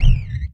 One Shot, Hits

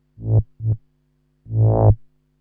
SNTH BASS TEKNO 140 A

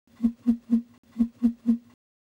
ETHNO 125, FX

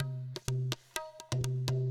PERCLOOP ETHNO 125

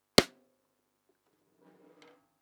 Snares & Claps, One Shots

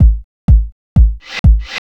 HOUSE BEATS 125

